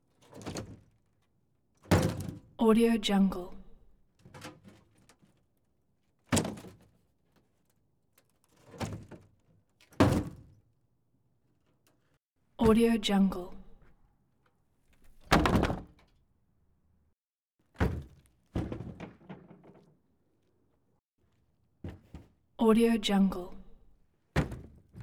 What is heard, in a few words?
crate various bangs multiple hits